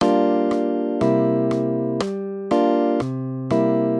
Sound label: chords fingering example, guitar; solo arrangement; bar two is easier to play than bar one and it also sounds more fluent in a solo arrangement